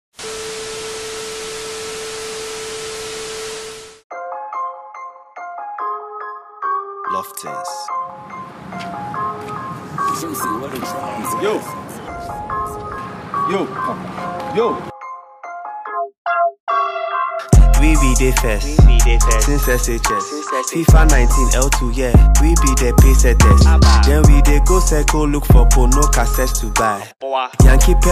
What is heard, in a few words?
Shaker Throwback